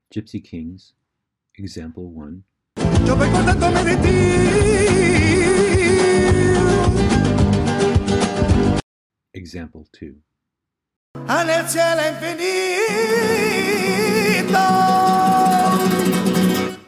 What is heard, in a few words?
Musical effect